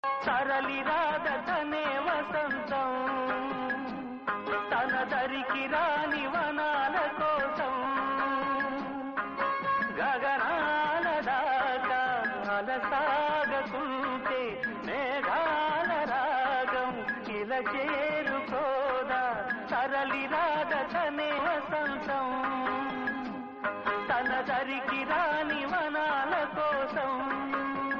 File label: Rudra Veena; Telugu